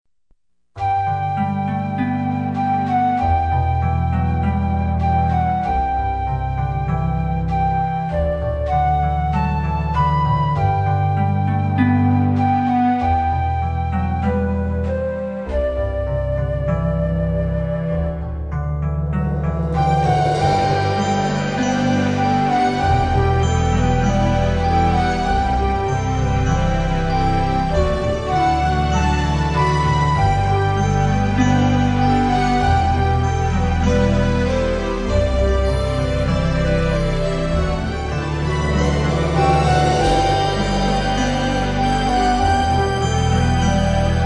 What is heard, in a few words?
Orchestral Theme